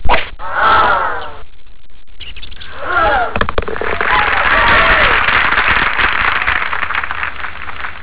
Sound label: golf